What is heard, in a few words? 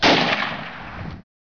rifle